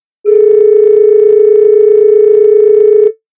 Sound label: very bad tune